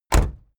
car camaro close door

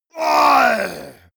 monster growl attack throaty; schreie

